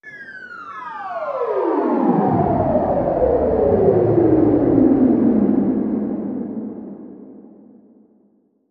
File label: You Lost Downer Transition